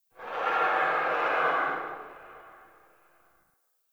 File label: Tree Distant Critters Angry; Warframe; Duviri; Ambience